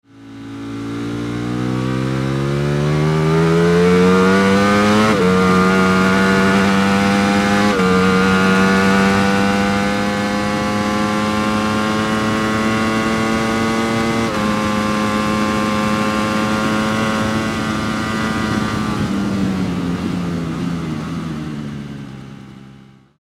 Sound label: Sound Panigale V4